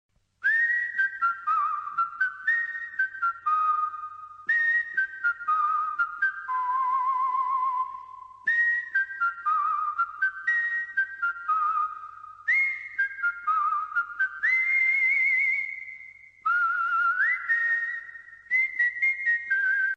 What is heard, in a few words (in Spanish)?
silbido; Gohan